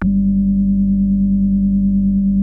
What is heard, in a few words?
JUNO 60 G2